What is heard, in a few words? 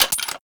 Rifle Alt Mech; Warframe; Weapons; Tenno; Sundial